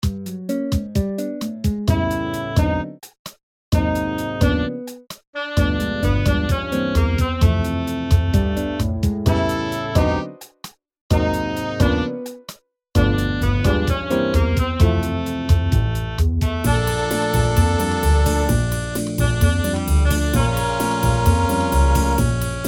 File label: bossa nova